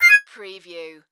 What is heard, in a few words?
Multimedia Alert